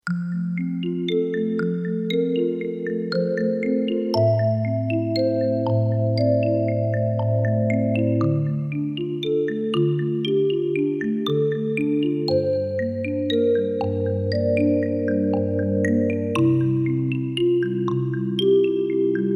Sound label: supersweet mallet